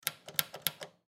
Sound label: Ship Radar System Flip Switch; Transportation Watercraft; Boat; Switches Multiple; Boat Sound Effects; Blastwave